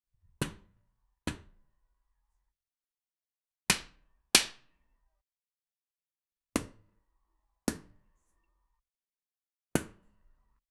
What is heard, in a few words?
paint brush hitting the w, brush